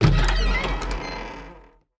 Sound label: open cabinet